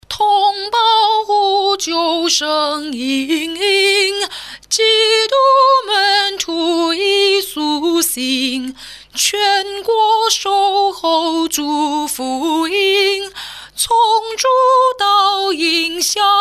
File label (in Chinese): 宣传福音歌演唱; 教会